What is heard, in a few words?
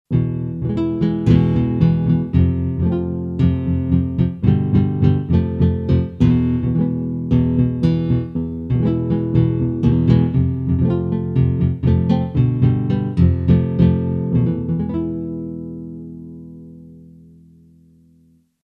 Charlie Lab Digitar